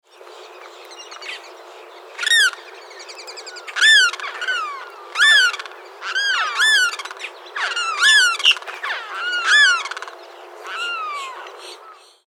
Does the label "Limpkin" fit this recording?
Yes